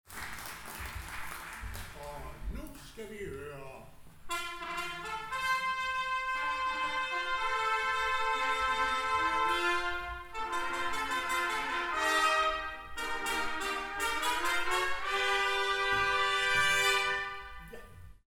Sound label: Fanfare; Hadsten 2010